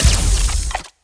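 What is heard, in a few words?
Short Laser gun Electron Impeller Fire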